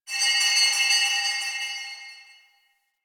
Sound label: Town Door Bells, Ambience; Warframe; Duviri